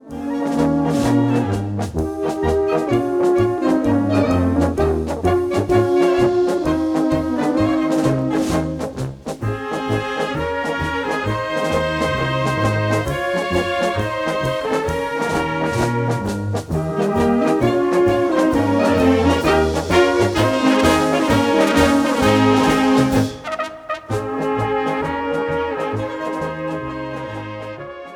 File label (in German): Polka